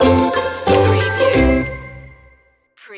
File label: plucky life stinger